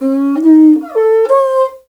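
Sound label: Afro Horn Phrase; Roland LCDP11 Africa VOL-1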